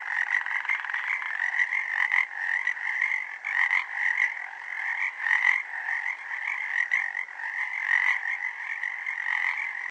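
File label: ambience toads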